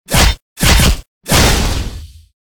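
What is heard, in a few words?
real blackguard attack, monster; thedead3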